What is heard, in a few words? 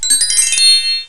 chime up; Informática Multimedia V-96 Curso interactivo de Visual Basic